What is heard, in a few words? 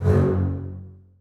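basses staccato f#1; Sonatina Symphonic Orchestra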